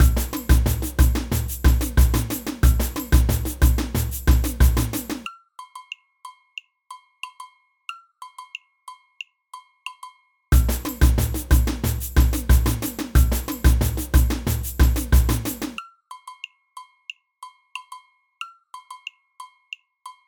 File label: agogo, samba